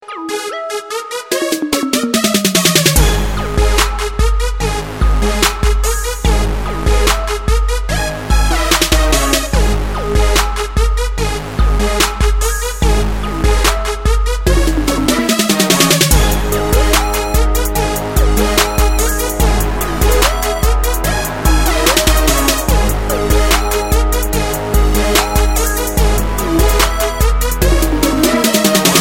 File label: Hip Hop Beat